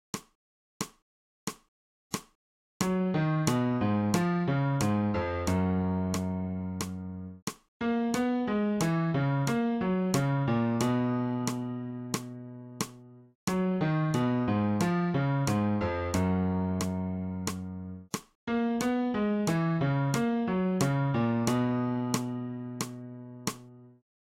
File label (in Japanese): 新フレットレス